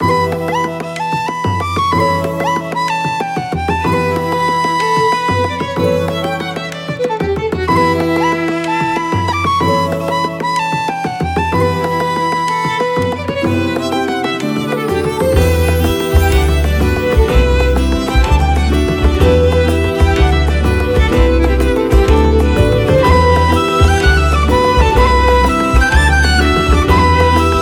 Nostalgic Jig loop

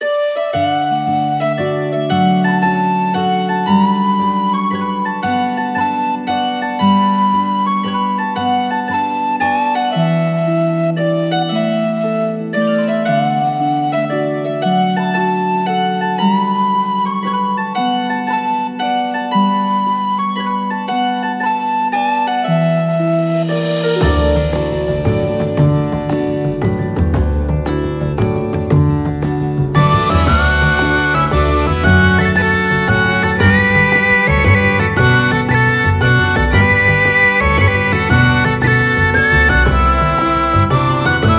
Soft Day loop

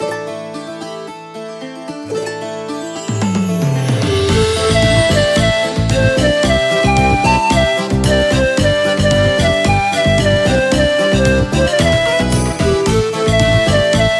Over World Folk loop

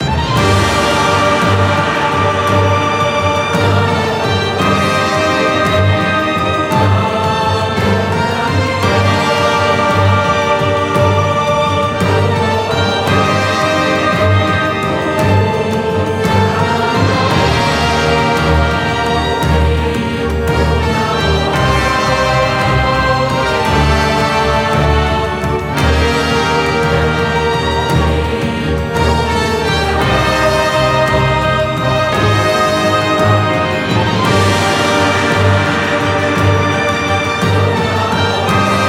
Scene Tragic loop

